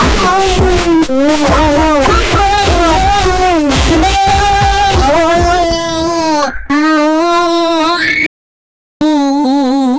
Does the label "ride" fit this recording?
No